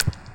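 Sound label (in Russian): шаг в лужу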